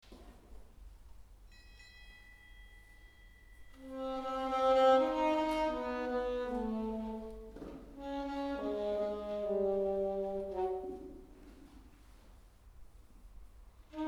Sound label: Wohin alto sax version; solo for optional woodwind